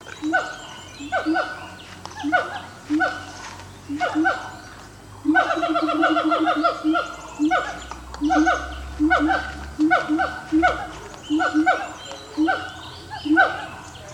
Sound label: symphalangus syndactylus, Mammal calls; Siamang; Fraser's Hill, Peninsular Malaysia, 12 Dec 2014